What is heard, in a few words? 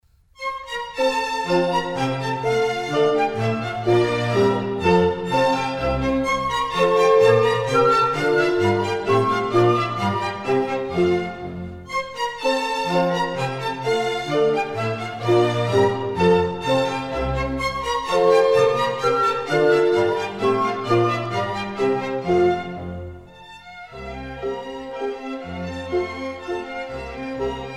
German Dances, F Major; Mozart; Dances and Marches